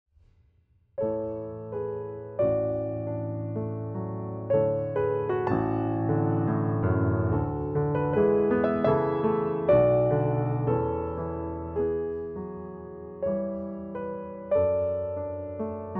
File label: 325 notes, Pianoteq